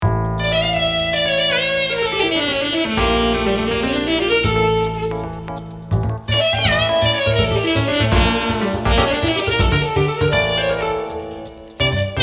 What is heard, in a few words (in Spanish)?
Buleria live, flamenco sax